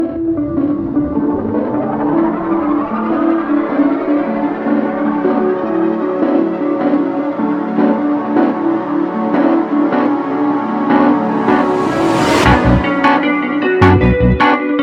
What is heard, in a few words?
Music Dubstep Role Play Game